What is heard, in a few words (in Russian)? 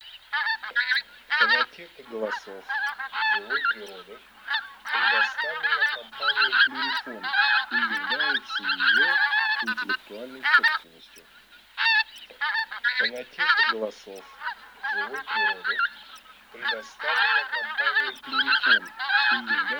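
Гуменник базар, манок; клюв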